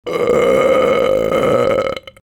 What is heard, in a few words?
burp